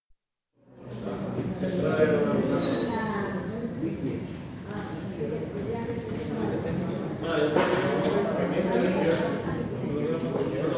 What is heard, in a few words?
Spain: Noisy cafe atmosphere, with general chatter from young people, occasional whistling from customer & espresso machine heard at intervals; Restaurant Sound Effects; BBC